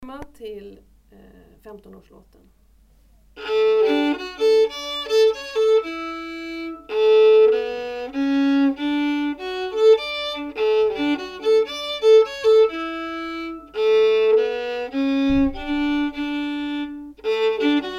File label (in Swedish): Stämma 15-årspolskan